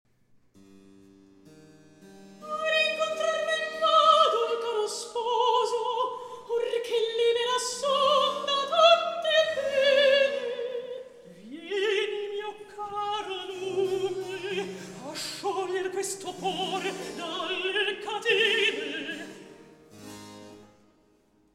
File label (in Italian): Recitativo; A Ricontrar Me'n Vado Il Caro Sposo; Polifemo